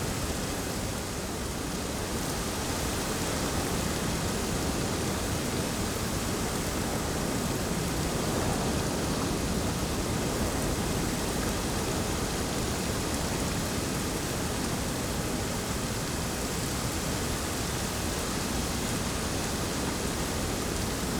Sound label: ambience desert day windy rainy; Rust